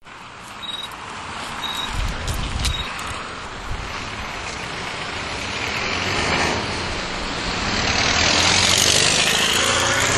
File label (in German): Platz der 5 Ecken, Murmansk; Soundmap Murmansk 2012